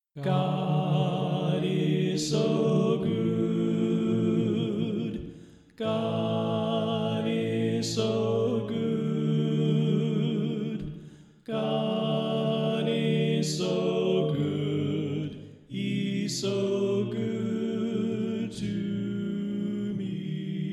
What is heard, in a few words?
Tenor Left